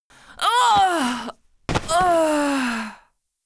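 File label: Archer female die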